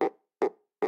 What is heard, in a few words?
Insect Weird